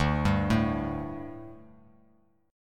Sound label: D Suspended 4th D, down, Bass Standard; arpeggiated